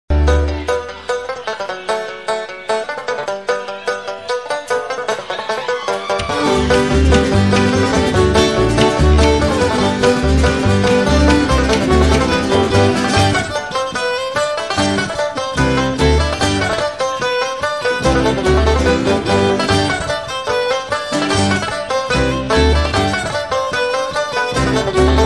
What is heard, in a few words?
Irish Pub Songs Banjo Reel